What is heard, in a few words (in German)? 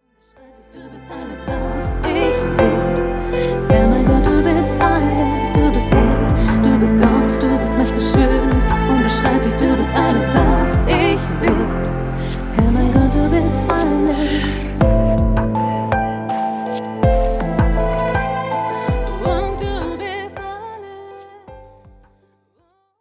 Ohne Worte